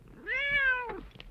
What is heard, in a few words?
Cat moan